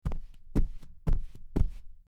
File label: footsteps sneaking